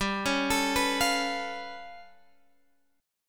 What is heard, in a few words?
G 9th Flat 5th, Guitar, Drop D